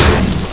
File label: Get hit